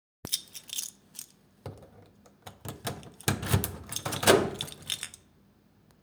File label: unlocking locking door and keys rattling